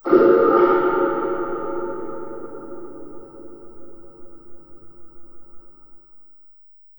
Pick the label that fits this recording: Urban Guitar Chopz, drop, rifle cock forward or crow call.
drop